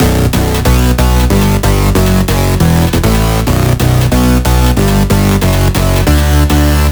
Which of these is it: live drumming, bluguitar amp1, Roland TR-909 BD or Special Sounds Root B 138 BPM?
Special Sounds Root B 138 BPM